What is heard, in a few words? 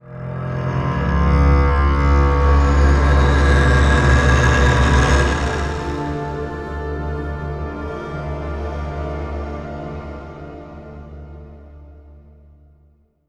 Round End; Shadows of Evil; Meatball